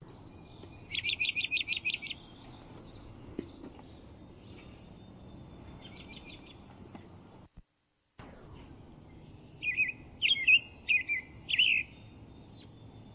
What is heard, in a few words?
american robin